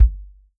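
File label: bass drum, Real Drum Kit; REAL DRUMS; Best Service ProSamples vol.43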